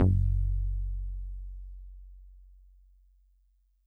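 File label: Solid Bass DX100 F#4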